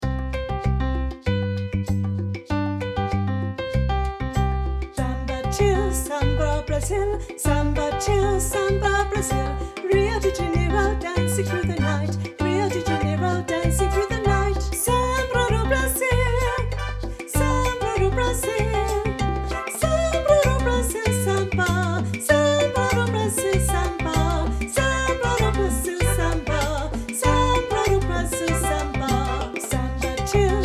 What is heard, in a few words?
Samba Chill